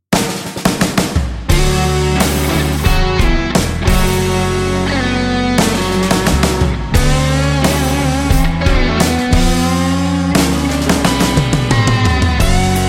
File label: Intro Rock Blues; blues intros